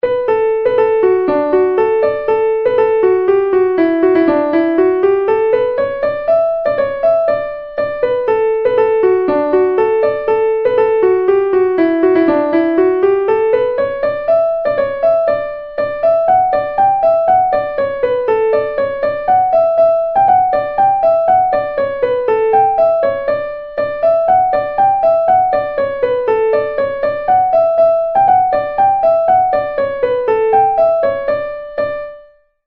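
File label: Little Diamond Polka, Traditional Irish Music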